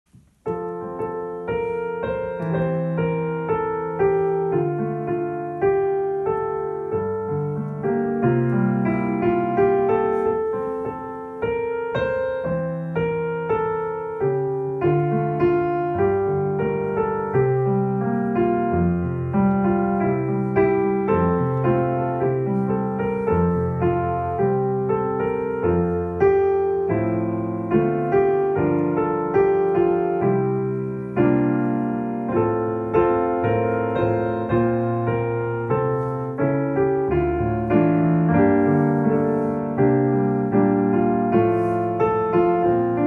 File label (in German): ode an die freude piano interpretation